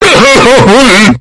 king crying

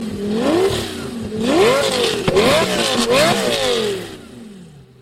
lamborghini

